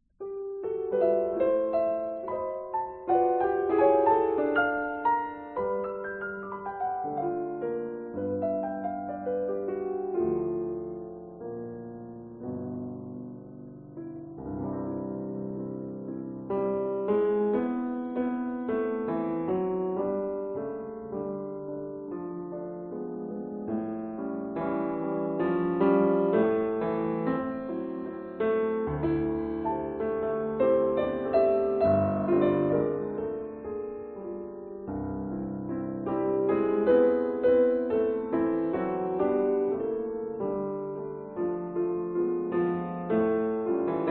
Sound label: Piano Pieces, lied transkriptionen; Greig; 1. I Love You